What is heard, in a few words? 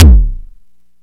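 electronic kick, electronic drums; Crypton; DrumBase5000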